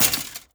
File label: step, footsteps, player, metal chainlink; Half Life Alyx